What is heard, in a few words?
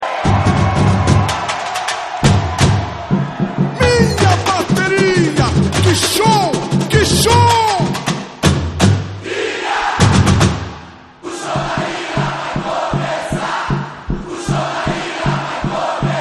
samba de enredo